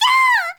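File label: girl scream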